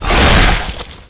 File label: zombie stab, claw; tayo escape